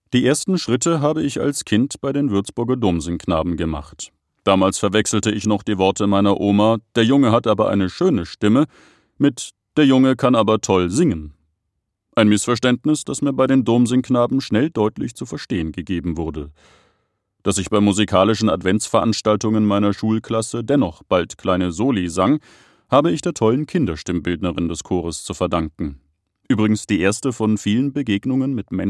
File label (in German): Singen